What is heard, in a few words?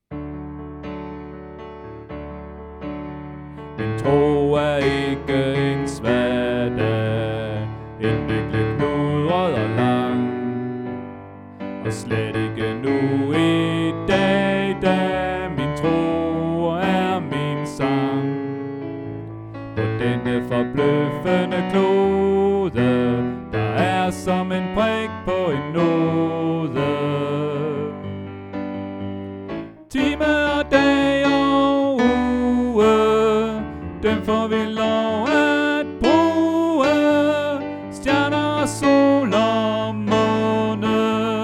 Tenor; oevefiler